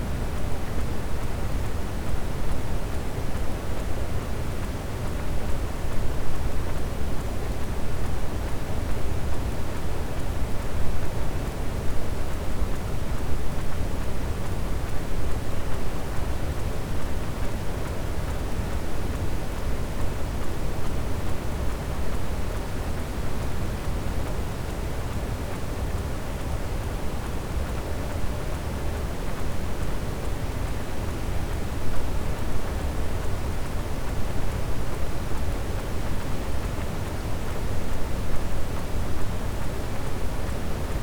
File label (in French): cachalot negatif, Physeter Macrocephalus